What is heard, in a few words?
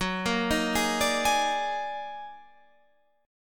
Eb Minor Major 11th